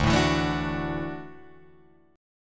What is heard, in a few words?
D-Major Add 9th D down Guitar Drop D